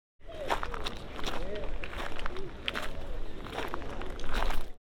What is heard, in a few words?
walking on beach, whitstable